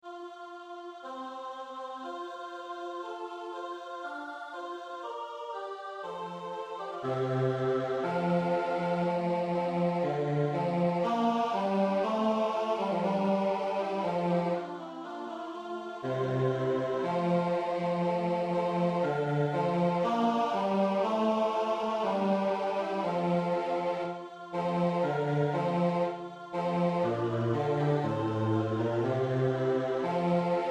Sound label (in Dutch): bas; Motetten; Aichinger